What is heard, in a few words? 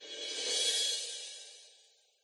cymbal Rev